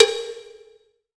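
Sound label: COWBELL, Drum Machines; EdgeSounds; Drum Mashines VOL-1; X-DRUMS KIT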